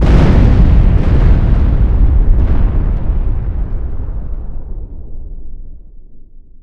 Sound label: SFX Dark Bombing; CastleVania 64; LoD